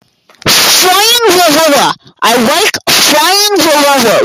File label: earrape river